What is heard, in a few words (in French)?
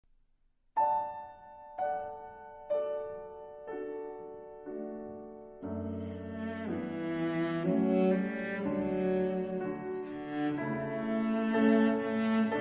Variations chantantes cello piano